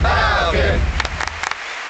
Cheer; Captain Falcon; Melee

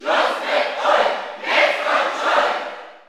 Cheer German; Shulk; SSBU

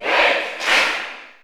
Cheer German; Pit; SSBU

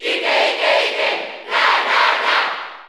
Cheer Spanish; Ike; SSBU

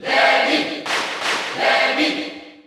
Cheer Dutch; SSBU

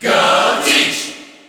Cheer English; Byleth; SSBU

